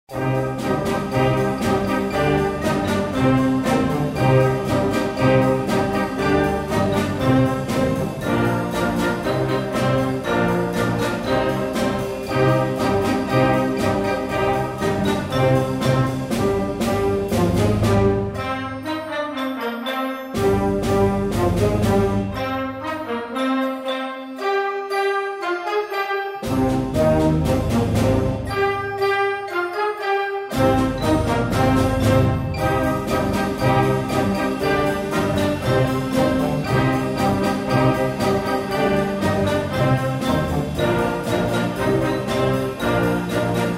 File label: Minor Rock